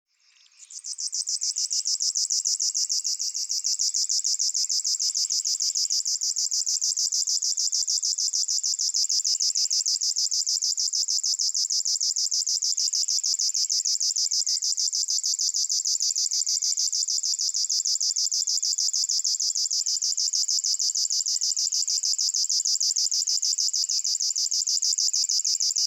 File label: Locustella fluviatillis